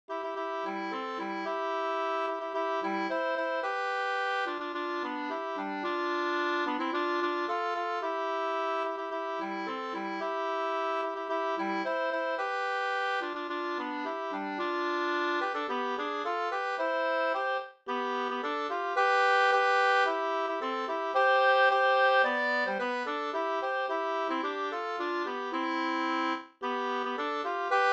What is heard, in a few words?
polka pariserpolka